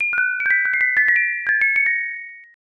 xmas bell

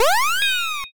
transform

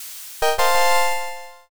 challenger

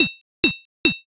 blacksmith

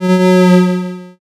creak

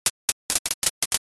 sparse clap

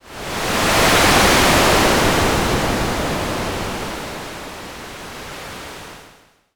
wind

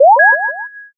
unlock

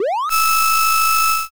drill

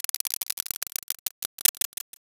roulette

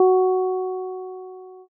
piano

